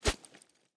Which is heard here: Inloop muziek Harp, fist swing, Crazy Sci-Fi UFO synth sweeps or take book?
fist swing